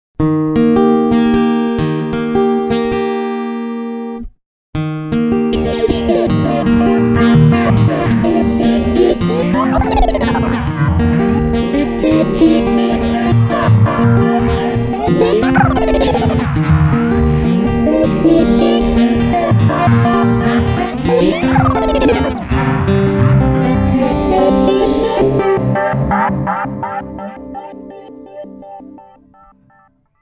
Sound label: Skipping Phaser Delay